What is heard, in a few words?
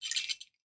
p atk shakebell